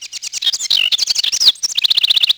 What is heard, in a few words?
Bird; Project Gotham Racing 2